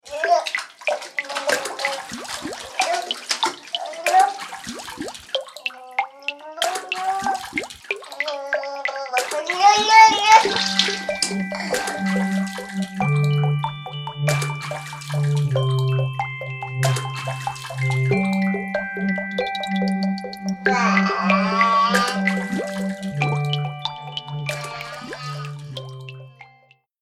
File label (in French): bain